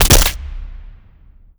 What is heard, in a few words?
bolt huge blast